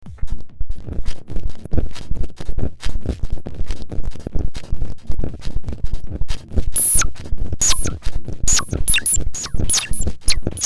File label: Techno noise fdbk